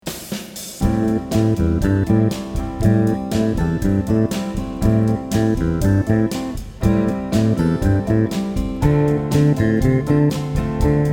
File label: minor blues in the key of C